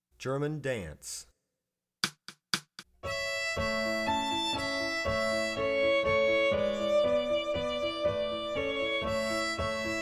German Dance

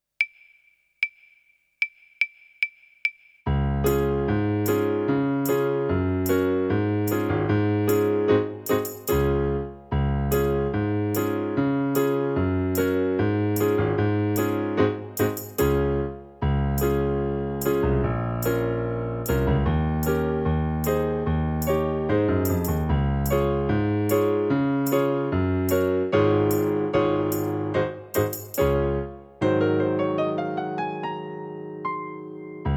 Old English Dance

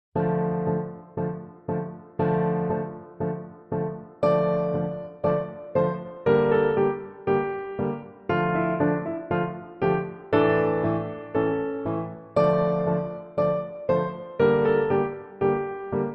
Accompaniment Track

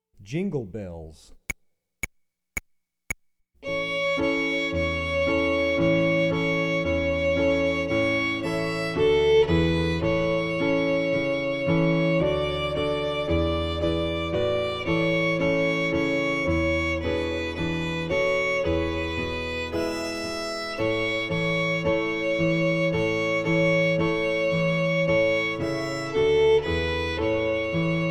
Jingle Bells

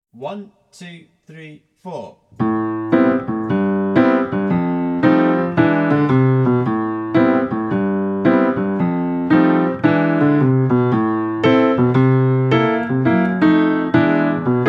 Piano; Canciones de pociones mágicas

